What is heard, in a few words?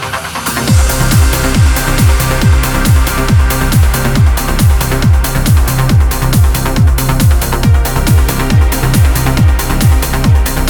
melodic uplifting trance